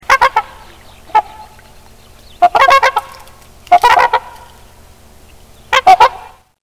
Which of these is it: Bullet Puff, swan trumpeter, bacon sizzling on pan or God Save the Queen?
swan trumpeter